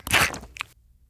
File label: ratmoth; Mob